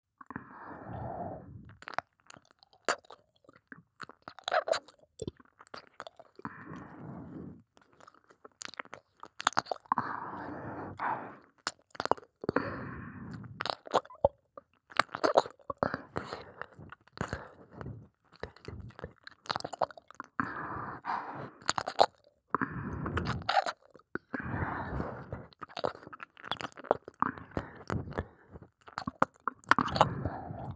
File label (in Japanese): 耳奥舐め 右 優しく